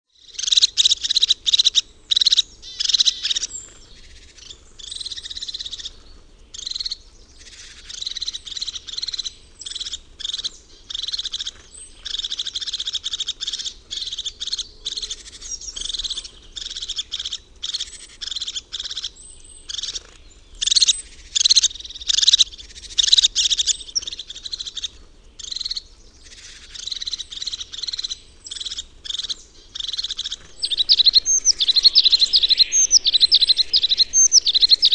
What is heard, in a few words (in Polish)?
czubatka